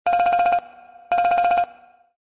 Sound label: htc notification ringtone